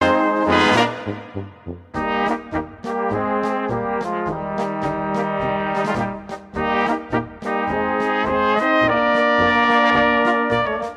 polka; nepomuk

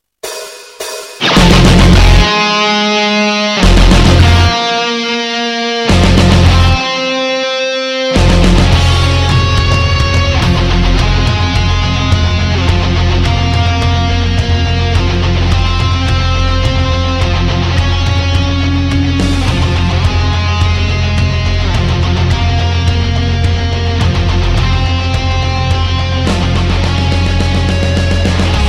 Metal Guitar

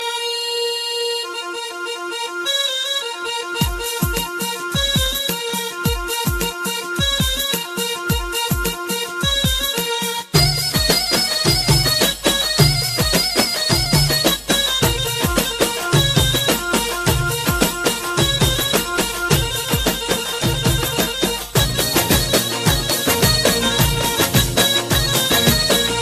Nice Arab Beat

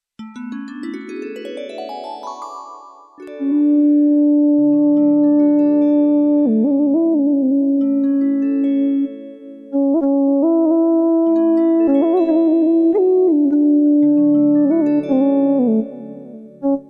Best Indian Flute

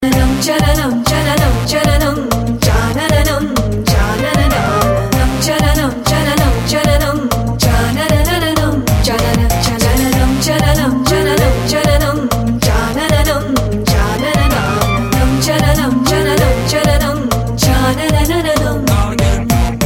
Beat

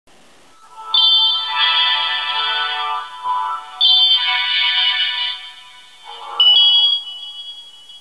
Nokia N73 Ringtone

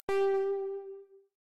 Confirm; Mass Effect

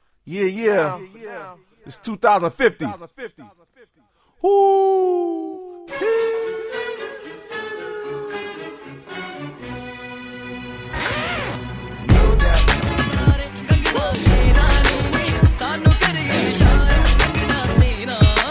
Hip-hop

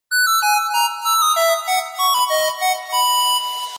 Nokia Flute

